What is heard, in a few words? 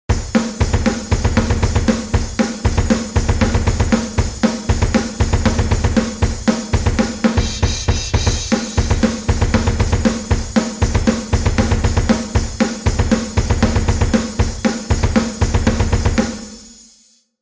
Drum Demo Off